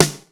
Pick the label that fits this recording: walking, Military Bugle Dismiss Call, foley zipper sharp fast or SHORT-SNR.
SHORT-SNR